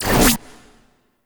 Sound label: Operators Amp Chassis Part C Fire End A; Weapons; Warframe; Amplifiers